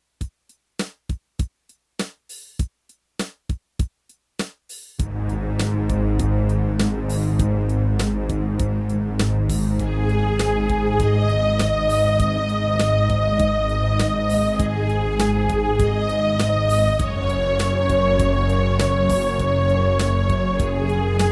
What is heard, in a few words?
KB Slow Mix; Tron Legacy